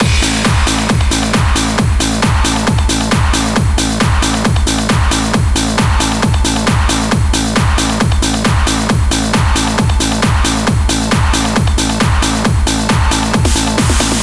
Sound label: music (loop)